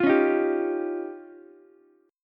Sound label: F 7th Suspended 2nd Sharp 5th, Ukulele; F7sus2#5; strummed; JGuitar